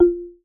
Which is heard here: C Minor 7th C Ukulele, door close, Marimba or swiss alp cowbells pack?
Marimba